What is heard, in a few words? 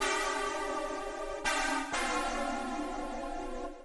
125 Soft pad C, Keys, Nu Jazz House; PowerFX - Nu Jazz House